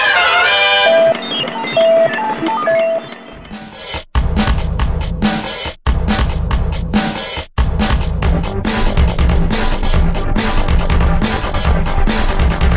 remix tone; batman

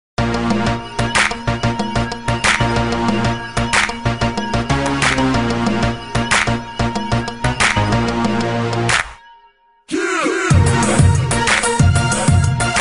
Hip Hop Mix

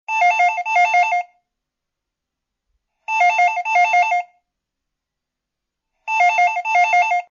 Phone Sound

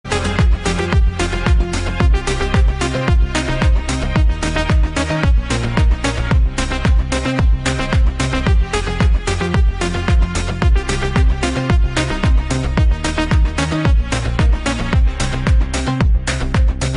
Dombra Remix

